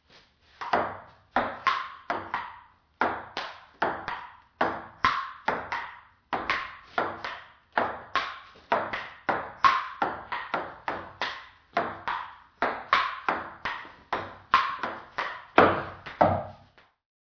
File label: Ping pong players